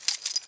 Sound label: dog collar short